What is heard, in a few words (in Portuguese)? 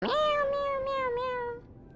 wall gato